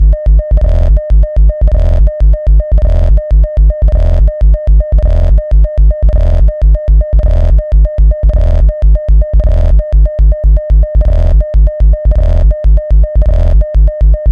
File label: techno